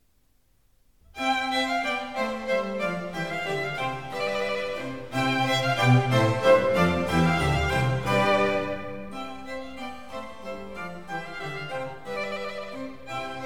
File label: Minuetto. Vivace, Conciertos Grossos; Concerto No.10 in C